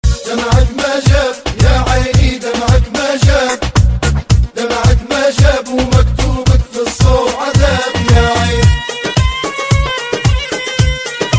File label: super arabic ringtone